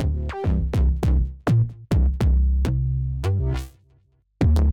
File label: Bass; simple session